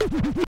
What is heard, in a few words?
SCRATCHES